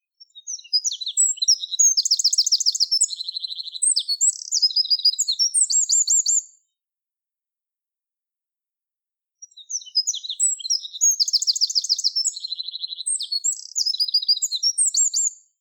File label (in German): Zaunkoenig, Enlischer Garten; Muenchen M; Heinrich Hottarek